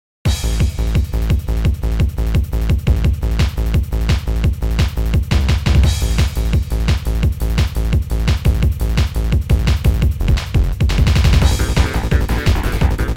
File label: song